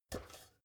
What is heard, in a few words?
step ladder metal grip single; schritte